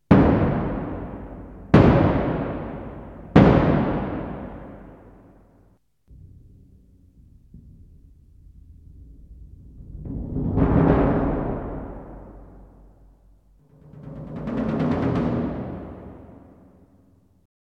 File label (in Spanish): Sonido de bombo; Instrumentos de percusion; Percusion indeterminada; membrana